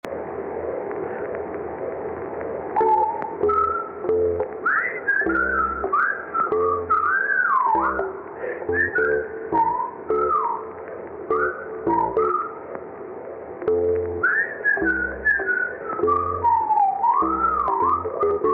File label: spooky i guess bass and whistle choir; happy oopy season